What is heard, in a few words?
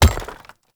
Hammer Impact Rock; Weapon Impacts; Player; Environment; Tuvalkane Hammer